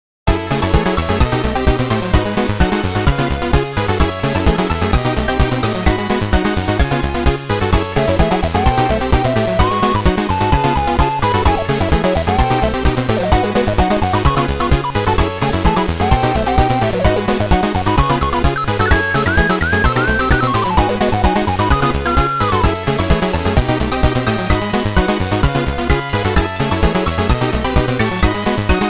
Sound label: chiptune; VRC6